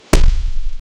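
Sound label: armored reptile stomp slow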